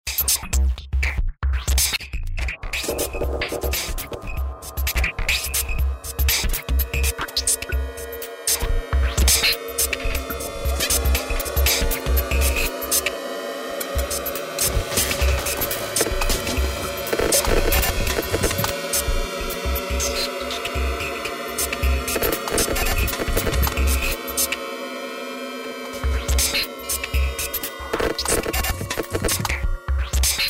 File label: Noise Party